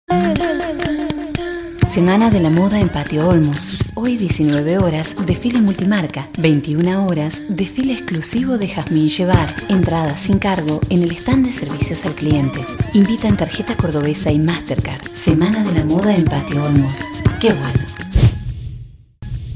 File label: ringtone